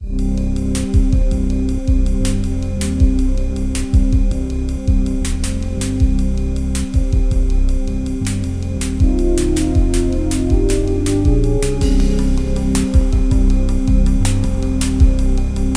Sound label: Jingle